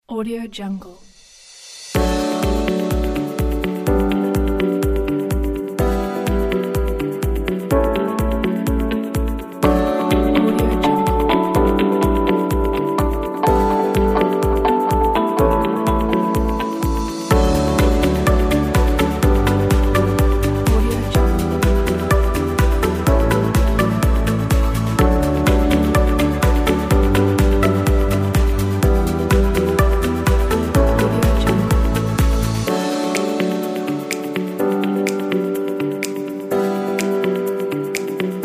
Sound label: relax motivate